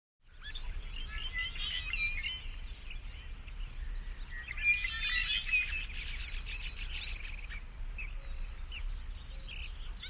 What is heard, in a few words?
Forest Birds Medium